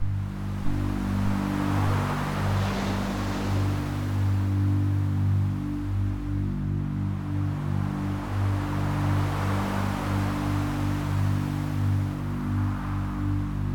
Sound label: Synth Pad Deep Ambience With Beach Waves